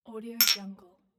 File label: vodka glass